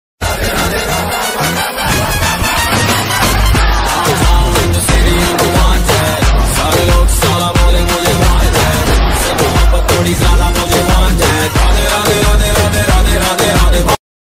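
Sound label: title song ringtone background music; salman khan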